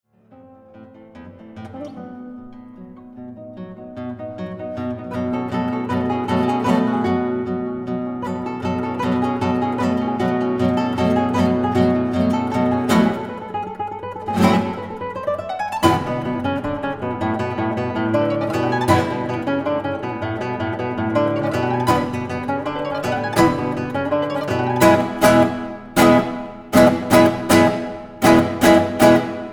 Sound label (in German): Gitarren Duo